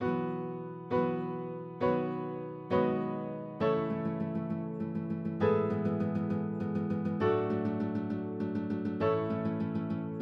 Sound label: Hope Courage instrumental; twelfth night audition material